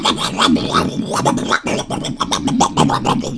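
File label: zombie gurgle; garrysmod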